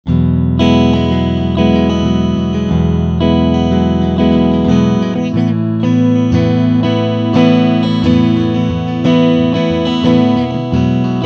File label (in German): Clean beide Pickups